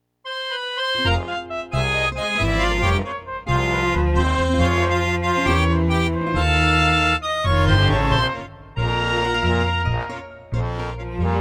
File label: Tango